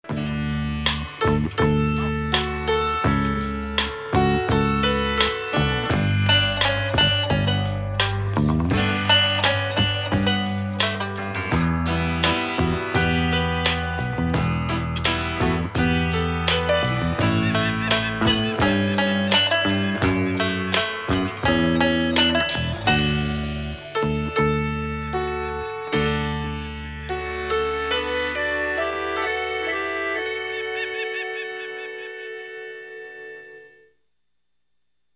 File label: Instrumental Theme; Emmet Otter's Jug-Band Christmas